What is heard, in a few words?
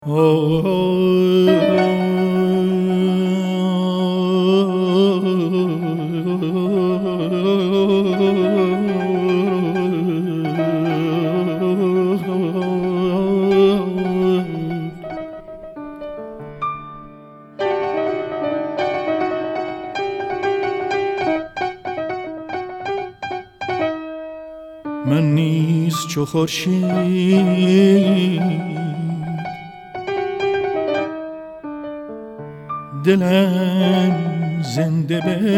Saz Va Avaze Zabol